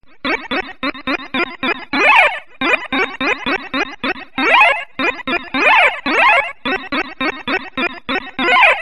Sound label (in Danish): old pc lyd